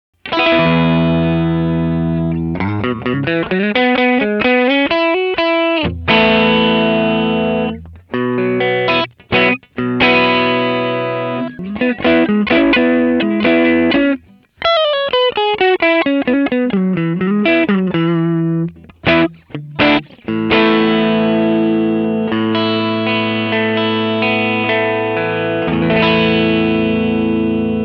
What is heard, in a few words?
Wilson 6 Both